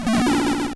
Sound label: Power Up Smoke sound effect; SMB3